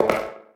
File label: pipe step; Golden Crayon Sounds